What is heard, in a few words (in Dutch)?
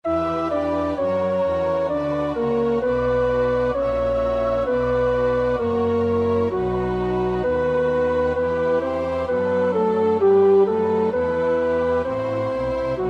tenor, koorpartij oefening; Bach (Johann Sebastiaan); BWV 004; 8.Wir essen und leben wohl